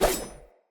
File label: Throwing Knives Throw